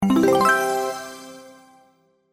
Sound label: unlock; bffs dark academia winter outfits